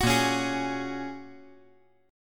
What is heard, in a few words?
A sharp Minor 7th Sharp 5th A sharp, 5G,4C,5E,5A; strummed; 11,10,9,11